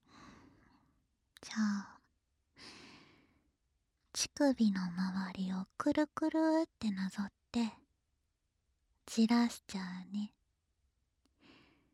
乳首責め＆耳舐めループ

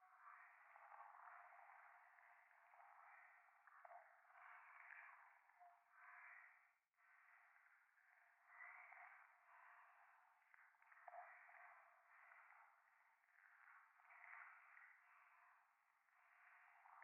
右耳 密着 吐息 触手; 夢の中

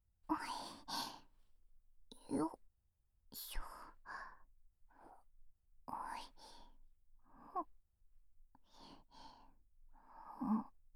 右耳 吐息 ループ音源

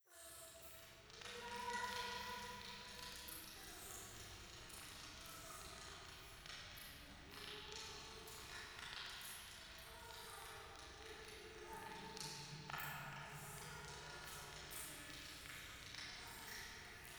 廃墟 イラマチオ 暖炉

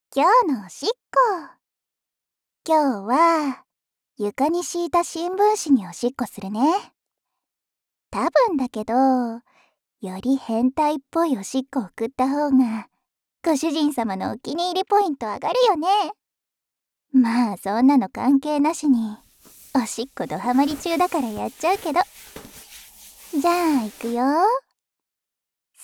今日のおしっこ 床に敷いた新聞紙に

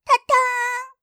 Windows開始

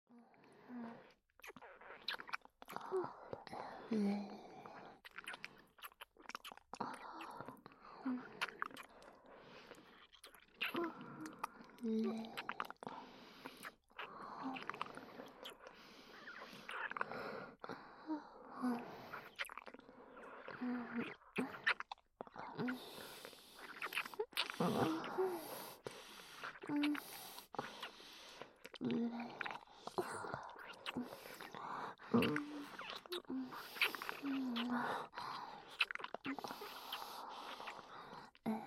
ダブルベロチューループ

